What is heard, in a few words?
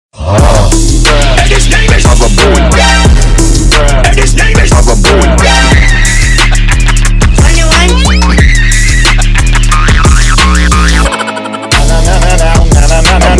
Goofy Ahh Type Beat Phonk